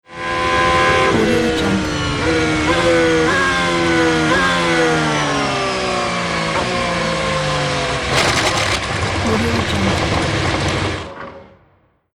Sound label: racing car skidding into gravel